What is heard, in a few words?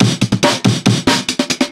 Fills, Loops 140 BPM